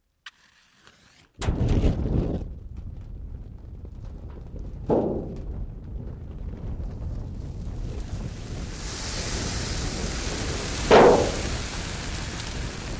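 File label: barrel sounds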